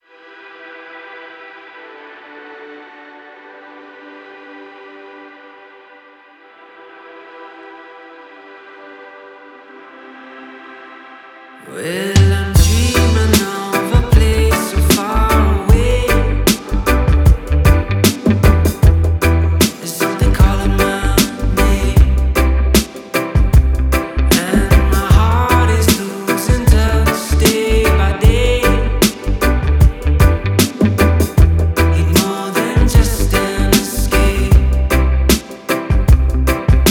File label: Reggae Remix